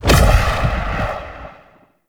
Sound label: Spirit Proj Impact; Characters; Enemy; Mockeries; Abilities; SubBoss; Mockery